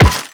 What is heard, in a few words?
Run Metal, Footsteps; Characters; Warframe; The New War; Kahl